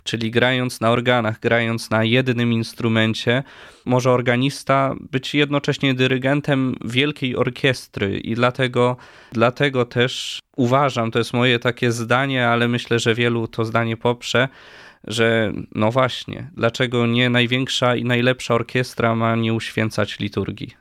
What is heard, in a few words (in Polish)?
organy